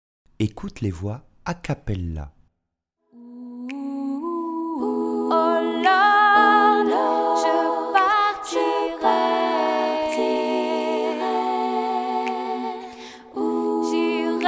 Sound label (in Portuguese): Voz a cappella vs acompanhada